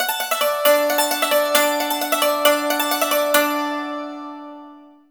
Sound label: YANG CHING, Heart of Asia; Spectrasonics